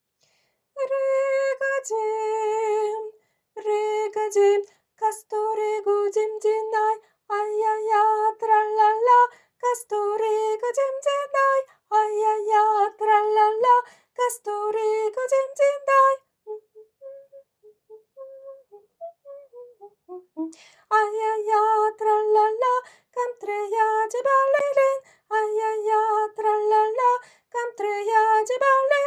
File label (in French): Riga tenor